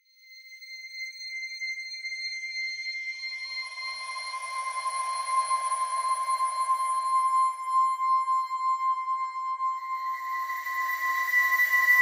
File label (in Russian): muzyka dlya serieznyh scen v video